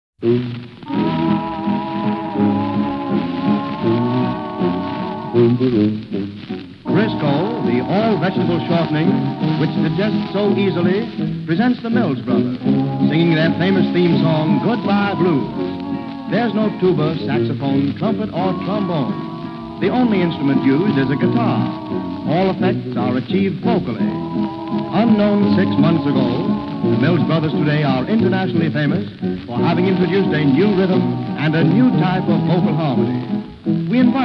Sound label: Goodbye Blues